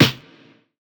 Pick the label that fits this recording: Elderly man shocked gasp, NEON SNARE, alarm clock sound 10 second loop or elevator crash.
NEON SNARE